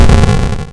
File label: boom